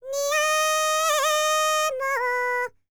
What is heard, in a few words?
BULGARIAN, World Voices, BALKAN